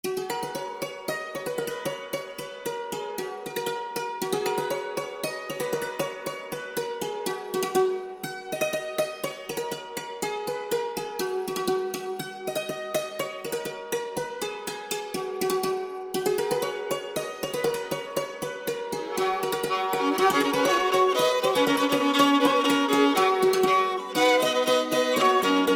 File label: Polka